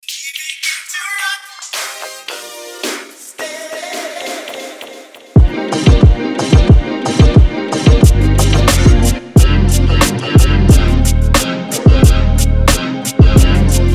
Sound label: Rocksteady Groove